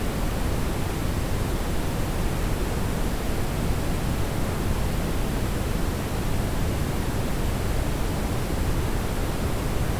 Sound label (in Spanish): ruido marron sonido marron